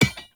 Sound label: Orb Cannon Drop Impact